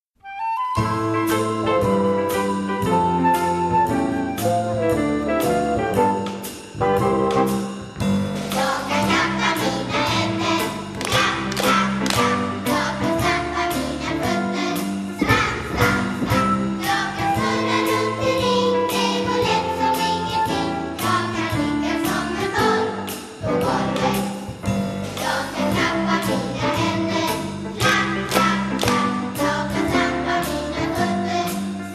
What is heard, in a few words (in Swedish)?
Klappa stampa snurra tonad